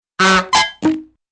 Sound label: honk high clank and doop